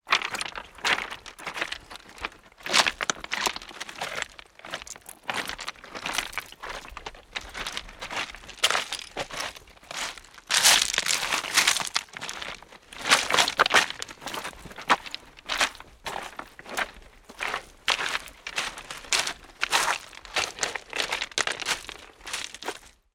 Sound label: foley footsteps beach rocks stones